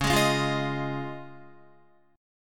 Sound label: Db Major Add 9th, Guitar, chord; strummed; JGuitar